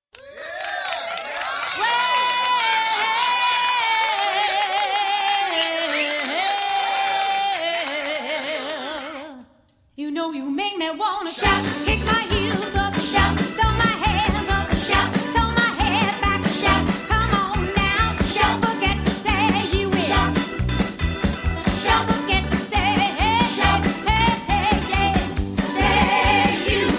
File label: Shout